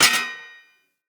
Long Sword Impact Block; Weapon Impacts; Player; Swords; Combat; Defender

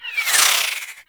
Warfan Projectiles Whizzby; Broken Frame

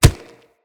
Kubrow Step Dirt, Footsteps

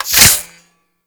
Goo Gun Reload

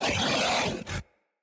Kubrow Feral Vocal Melee Attack

